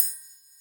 Tring, Boss DR660, perc

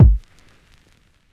kick; eddyflux; crate bd; dough-samples

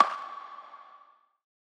perc; eddyflux

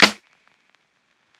snare; dough-samples; eddyflux

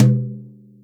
Lite T3, Boss DR660, lt; drum machine

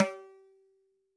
dhin, mrid

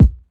kick; eddyflux; crate bd; dough-samples

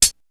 HH, MPC1000; drum machine; CHH

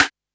snare; dough-samples; eddyflux

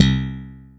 SlapBs, BossDR660 fx; drum machine

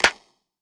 clap; eddyflux; dough-samples

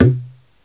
gumki, mrid

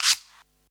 shaker; dough-samples; eddyflux

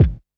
kick; eddyflux; crate bd; dough-samples

